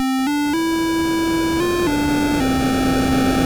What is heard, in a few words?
GAME START; pete rose baseball; atari 7800